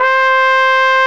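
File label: brass, cheese trumpet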